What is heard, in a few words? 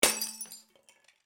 Jam Destroying; my cute commissar 2020 gamerip